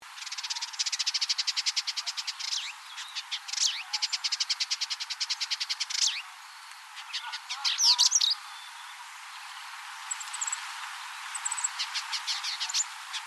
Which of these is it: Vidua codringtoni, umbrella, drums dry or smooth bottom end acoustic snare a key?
Vidua codringtoni